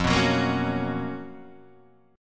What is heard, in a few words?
C sharp 13th F